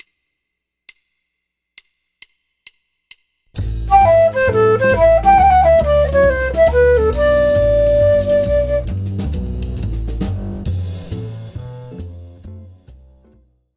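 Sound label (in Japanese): Am7 D7 Dorian Mixolydian フレーズ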